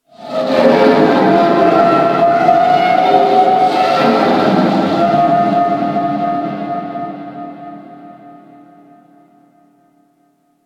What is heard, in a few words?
Infested Distant Metal Groan; Warframe; Corpus Infested